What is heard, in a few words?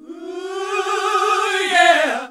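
Gospel; Best Service Gigapack 1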